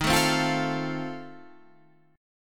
F 7th Eb

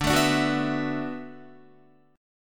D 6th Add 9th, Guitar; D6add9 strummed; tuning 4E,4A,5D,5G,5C,6F

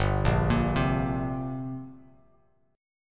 G Minor Double Flat 5th, G, Bass Standard; arpeggiated; JGuitar

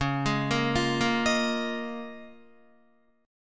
C sharp 6th Add 9th C sharp Guitar; arpeggiated

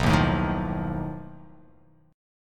B 7th Flat 5th B, chord

